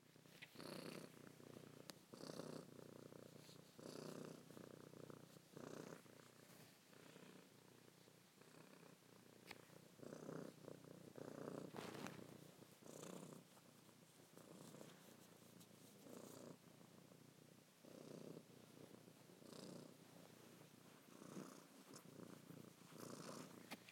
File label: purrs; percy